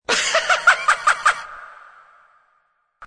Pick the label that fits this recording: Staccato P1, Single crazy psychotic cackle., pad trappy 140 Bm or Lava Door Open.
Single crazy psychotic cackle.